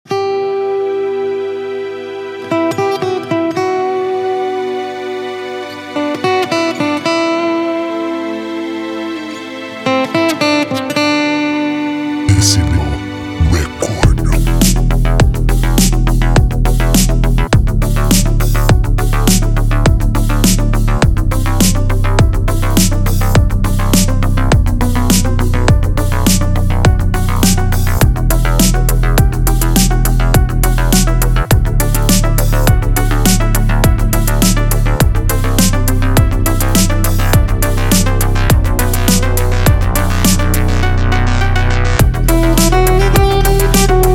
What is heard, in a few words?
Acoustic guitar; Kshmr Patch